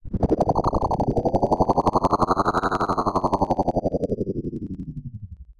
Science Fiction Sci-Fi Electronic Space Ship Engine Warble